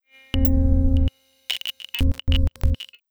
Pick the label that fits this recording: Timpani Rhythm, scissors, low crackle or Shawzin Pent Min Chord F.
low crackle